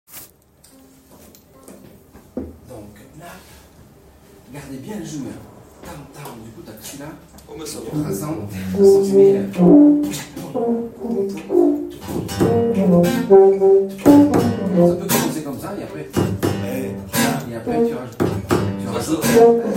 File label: Rondo groove